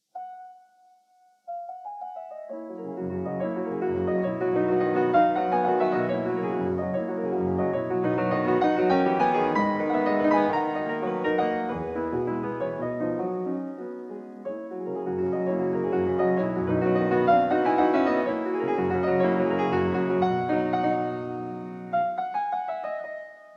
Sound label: Prelude No. 11 in B major; F. Chopin; 24 Preludes, Op 28